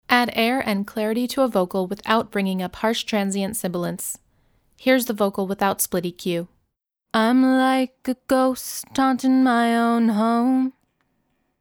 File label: Vocal; Enhance; SplitEQ